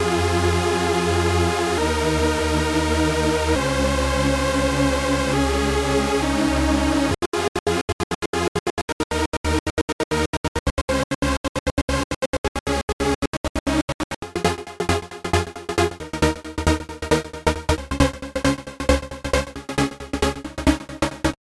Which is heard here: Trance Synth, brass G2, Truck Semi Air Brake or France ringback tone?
Trance Synth